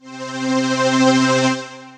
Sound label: Crumar Violin Cello Layered, String Machines; Crumar Orchestrator; Ableton; Factory Packs; Retro Synths